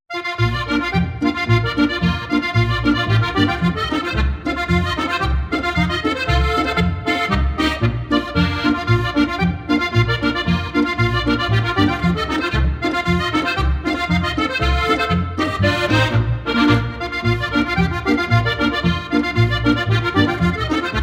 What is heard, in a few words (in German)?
polka; MK St.Johann